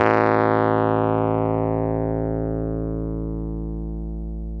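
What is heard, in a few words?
piano, Wurly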